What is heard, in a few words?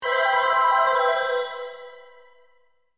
Logo (Arcade Version); Konami